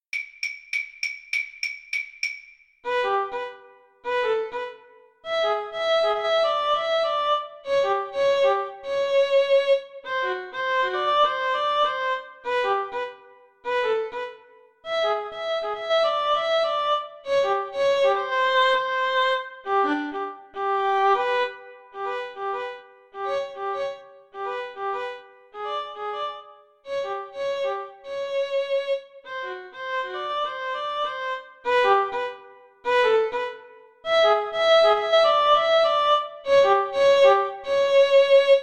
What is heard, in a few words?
rag VLN VLA duet, Violin Viola Duet